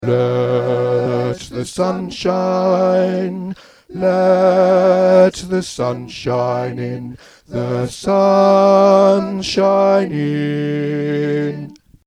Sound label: Bass Voice